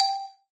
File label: Combo Sound; candy crush saga gamerip